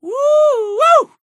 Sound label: woo